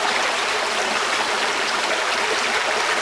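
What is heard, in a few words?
water fire out loop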